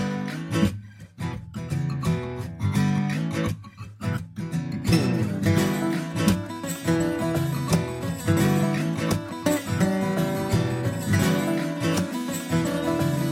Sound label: Instrumental